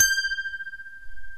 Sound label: BOTL MARIMBA; OMI - Universe of Sounds